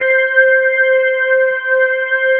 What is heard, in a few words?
ORGAN, Drum & Bass, 160 BPM; Ueberschall; Drum & Bass Flash Trackz